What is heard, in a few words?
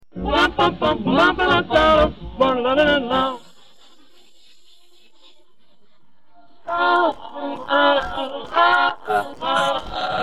funk, vocals